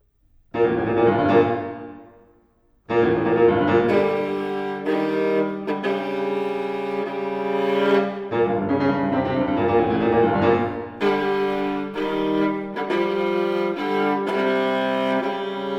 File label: Sonata for Viola and Piano; 2 Mvmt